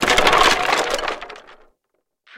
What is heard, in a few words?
Debris Wood Crash